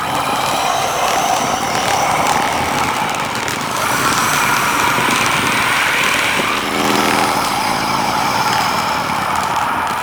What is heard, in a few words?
Shield Energy Loop; Warframe; Warframes; Garuda